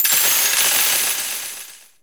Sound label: SE GAME BREAK; maimai